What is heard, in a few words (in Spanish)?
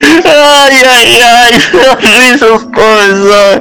ayayay